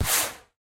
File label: Missile Launch